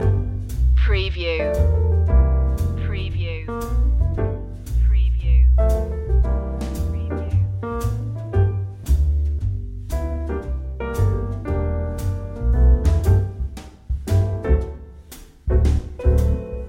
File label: muddy water loop